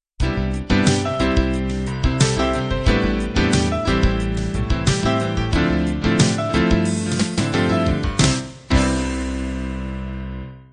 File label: piano jazz-pop end